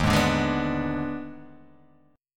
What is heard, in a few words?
D sharp Major 7th Suspended 4th Sharp 5th, Guitar, Open G; chordshape; 1,1,0,1,0,0